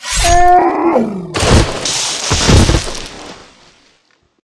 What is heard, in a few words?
die, hunt; Cosmic Rage